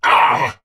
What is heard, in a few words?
Pain; Warframe; Dax Knight; Battle Barks